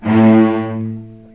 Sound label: A1 AT LNG L, VCS ARCO, String Collection; East West; The Ultimate String Collection